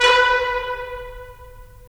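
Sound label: brass trumpets staccato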